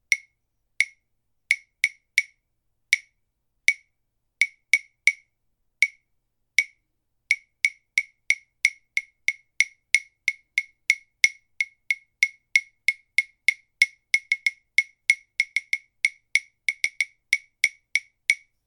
claves kinder

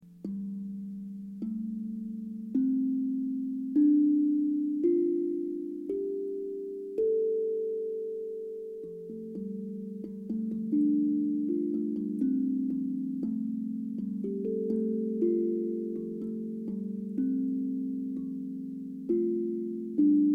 hapi f dur

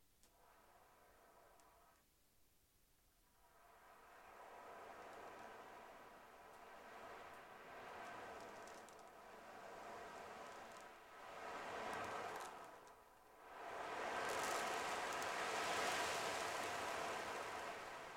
meerestrommel

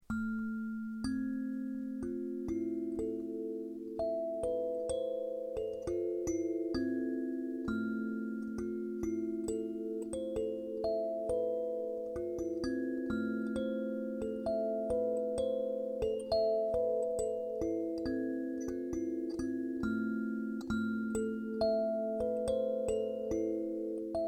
sansula

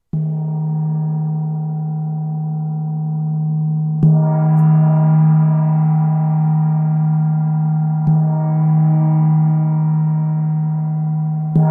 sonnengongs

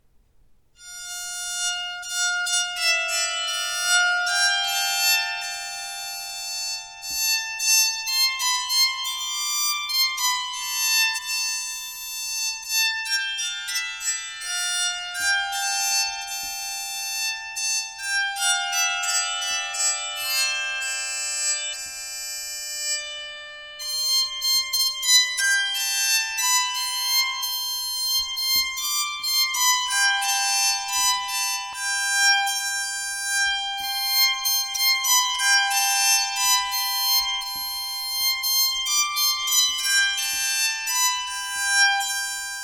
streichpsalter sopran melodie

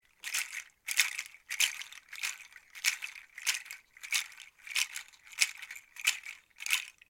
nussrasseln pangi

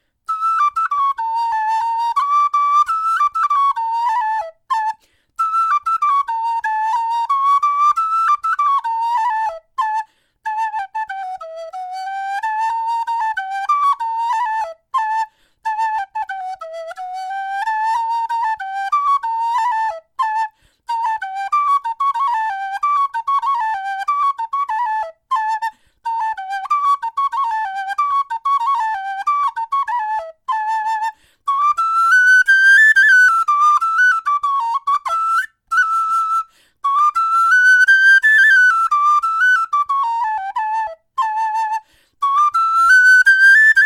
blockfloeten sopran melodie; schneider

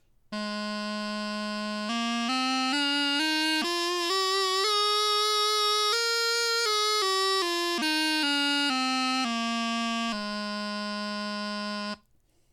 chanter tonleiter

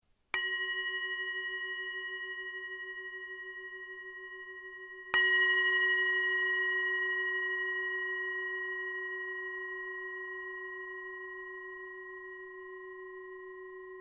klangschale

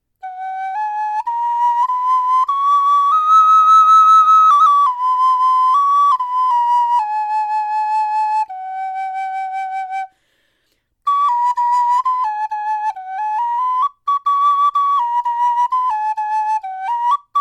kindergartenfloete

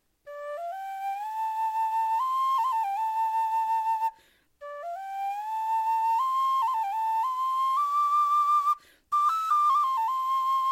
indianerfloete

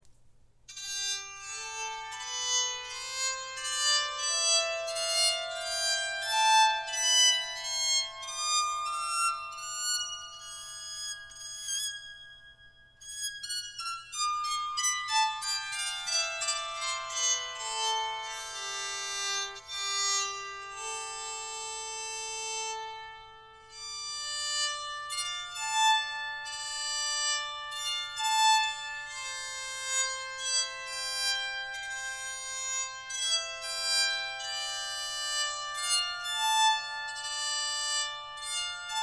streichpsalter alt

